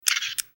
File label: shutter